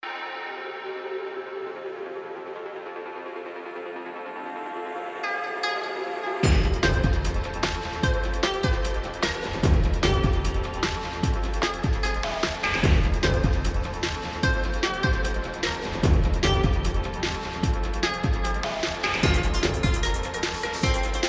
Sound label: MID BOSS; Inukari Chase of Deception; xbox series xs; Forest 02